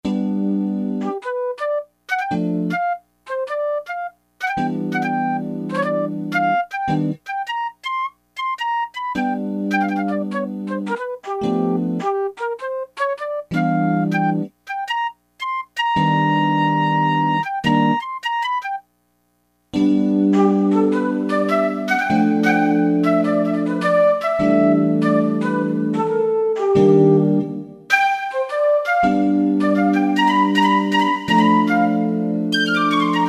Medium Bright 1.15 sec, Alesis MidiVerb II

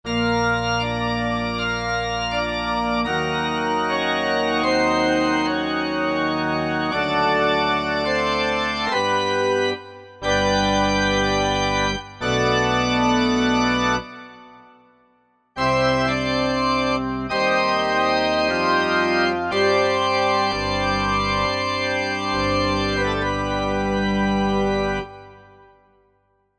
Pipe Organ, Korg MSC-9S Organ

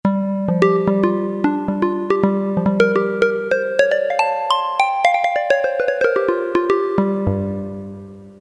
Syn Mallet; Roland MT-32

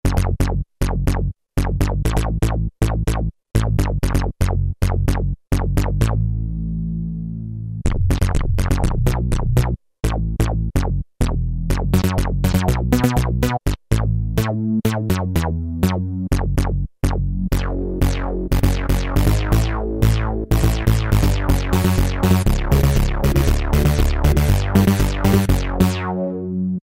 MATRIX BIGBASSE3, Kurzweil K2000 Analog; Kurzweil K2000 Best of Analog

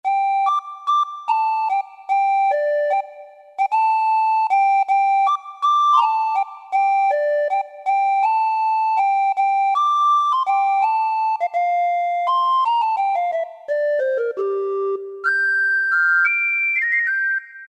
Ocarina; Roland PN-D50-01

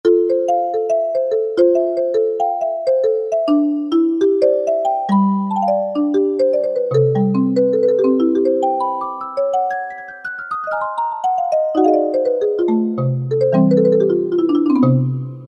Xylophone; Generalmusic S2R

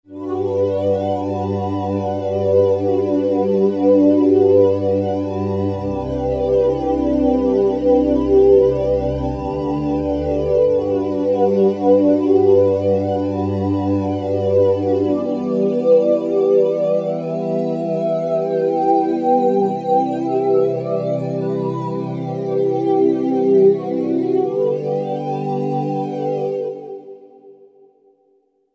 V-Vox, Roland V-Synth; Factory Patches

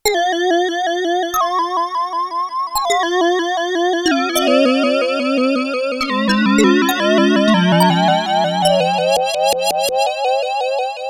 SPACE SYNTH III, Korg DW-8000; Factory Patches

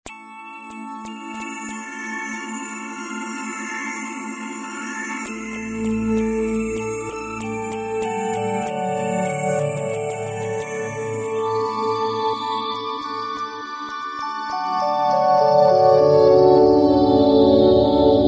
Glass Pinbels, Roland V-Synth; Factory Patches

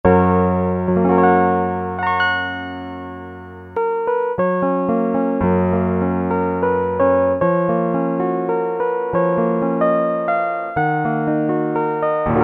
PIANO, Yamaha DX7

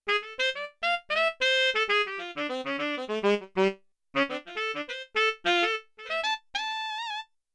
Tenor, Big Band; Yamaha TX16W; Big Band Performance

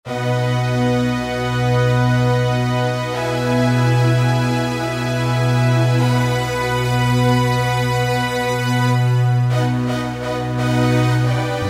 Velo Syn Strings, Roland JD-990; Factory Patches

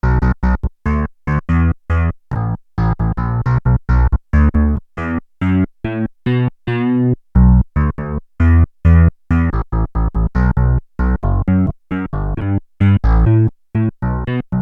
BASS I, Korg DW-8000; Factory Patches

